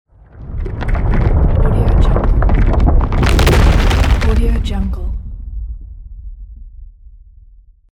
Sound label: big rock fall